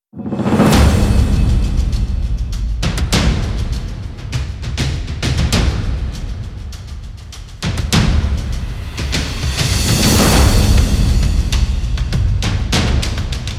War Drums Trailer

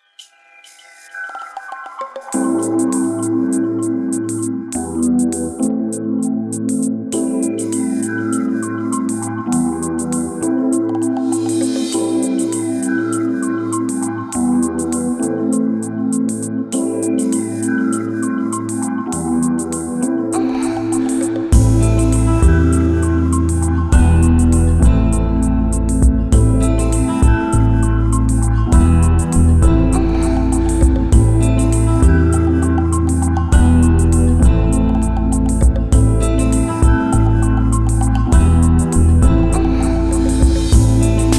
Keys And Trumpet Mix

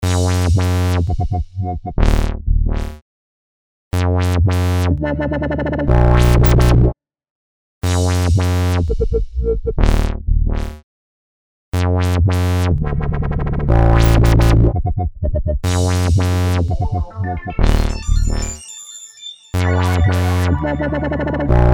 Chill Out Noise Dubstep Mix

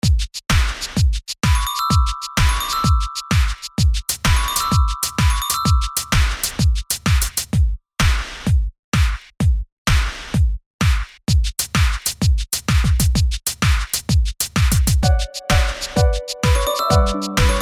Glockenspiel House Mix

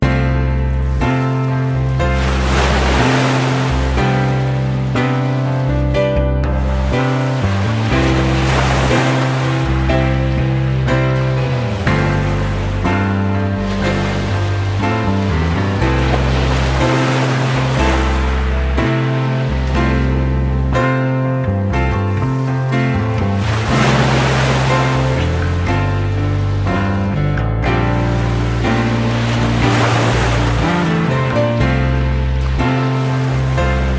Slow Piano Ocean

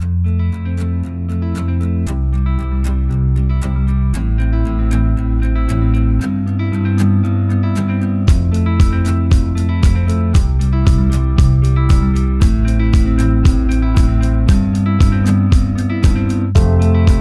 Acoustic Inspiring Groovy Autumn background

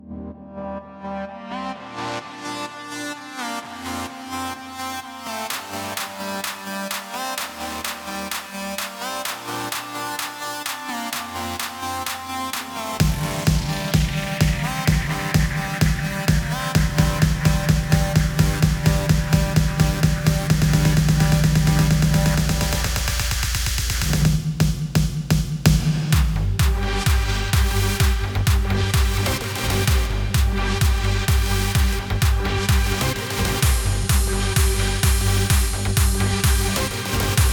Club EDM The Noise EDM Mix; Berlin

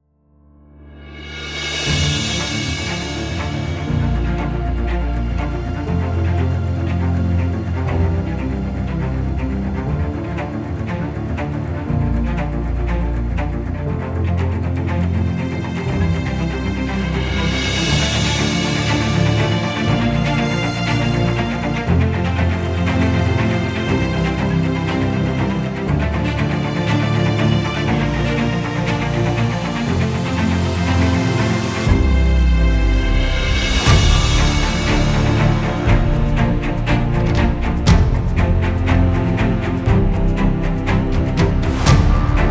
Brave Epic Trailer; soundbay

